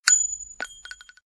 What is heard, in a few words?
Gun Ricochet